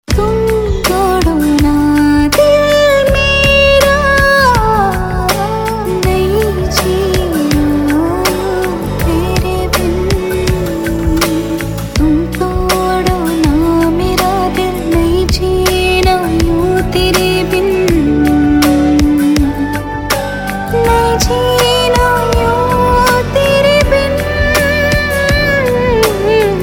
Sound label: female version ringtone; bollywood ringtones